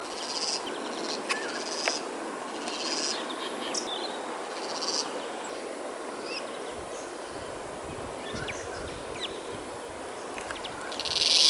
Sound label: litoria olongburensis; Wallum Sedgefrog